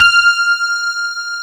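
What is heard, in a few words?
grand piano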